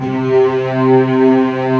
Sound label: ACSTC STRING, JV 1080; Pro-Rec - Synthsonic